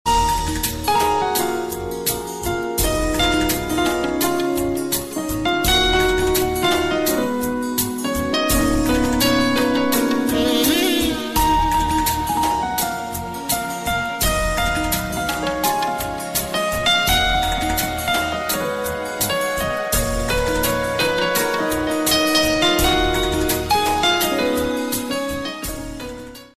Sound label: Old Hindi Ringtone Instrumental